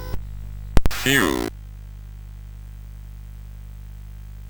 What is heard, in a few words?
sid CSG8580R5